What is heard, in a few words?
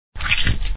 Suck; Lewd SFX